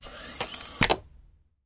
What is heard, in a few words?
Chair Office Air Cushi, squeaks & creeks